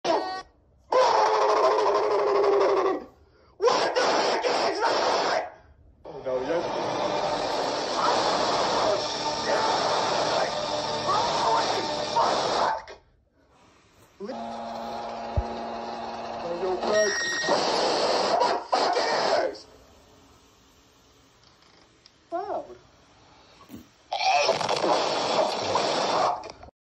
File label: BRRRRROOOO, guy screaming